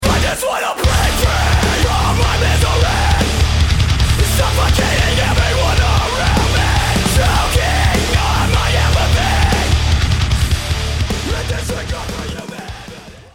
start action, music

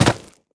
step run, footsteps player debris

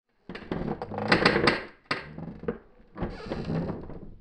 house creak; ambient; inferno

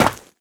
step run, footsteps, gravel

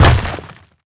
land, footsteps, gravel; player

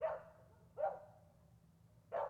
dog bark distant; ambient; creatures

